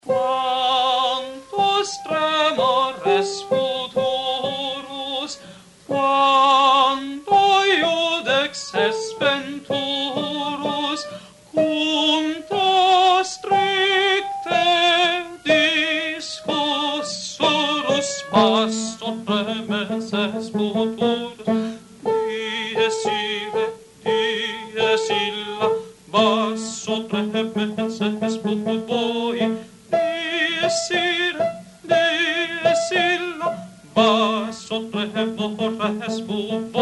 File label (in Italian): Dies irae soprani; Mozart